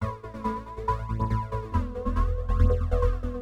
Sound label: TEKNO 140; Techno2